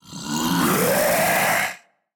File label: Victory; Magic Shrek